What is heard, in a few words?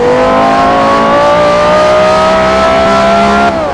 third, vehicles; carreragt